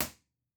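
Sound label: Live Room impulse response; Physics and Electronic Engineering Buildings; B-Format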